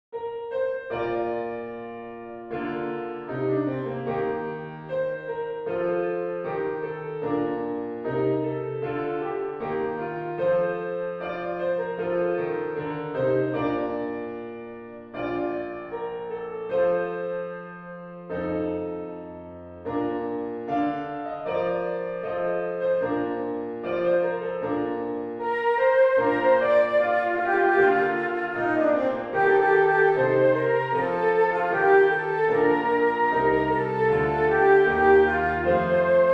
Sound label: piano; the emigrant 1823